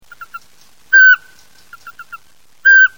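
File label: vorobinyy sych voice, pygmy owl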